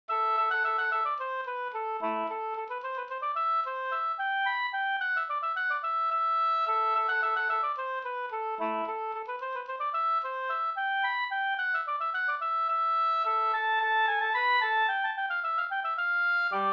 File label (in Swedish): polska Bingsjopolska